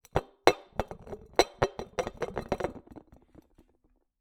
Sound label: Base Wobble, Medium Wine Glass